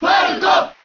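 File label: Cheer Japanese; Melee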